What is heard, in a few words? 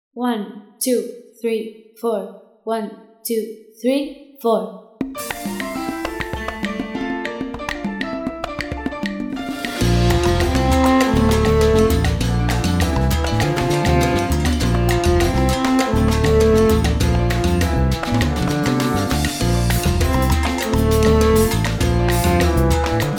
got salsa viola